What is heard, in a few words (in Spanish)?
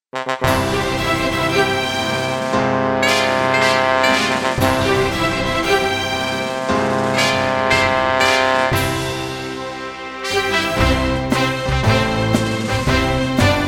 pista himno; northfield concert